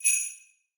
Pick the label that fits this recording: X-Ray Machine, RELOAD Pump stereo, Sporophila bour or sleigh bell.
sleigh bell